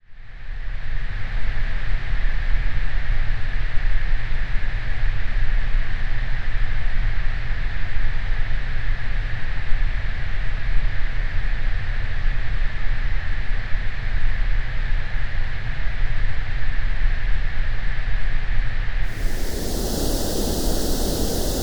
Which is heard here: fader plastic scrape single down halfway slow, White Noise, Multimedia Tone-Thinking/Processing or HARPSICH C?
White Noise